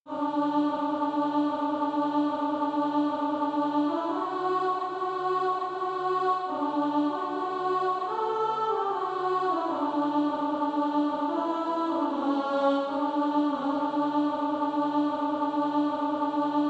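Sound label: alto, Practise Music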